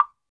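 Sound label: percussion wood, wood block; Roland LCDP11 Africa VOL-1; Wood & Metal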